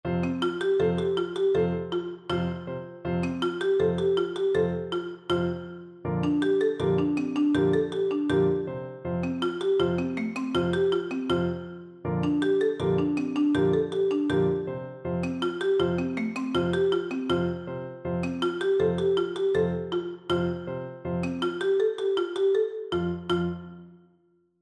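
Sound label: marimba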